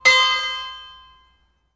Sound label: Small New England, bell towers